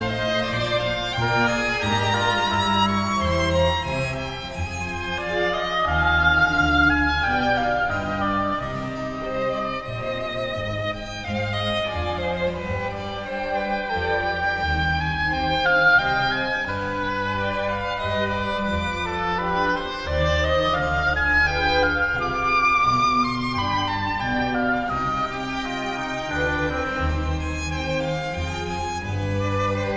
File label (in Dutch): Adagio (concert voor viool en hobo)